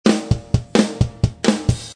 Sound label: Dirty Rock, Heavy Modern Funk & Rock; Loopology